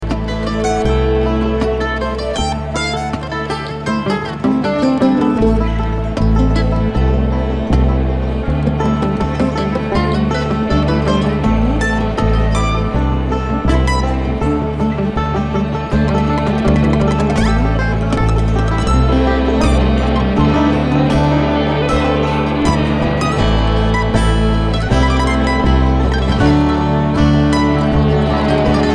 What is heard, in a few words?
Traffic Jam